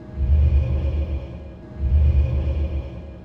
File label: Gate; ambience